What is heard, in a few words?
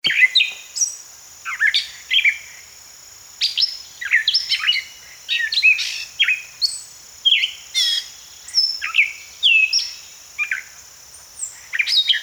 Gray Catbird Dumetella carolinensis; thrushes thrashers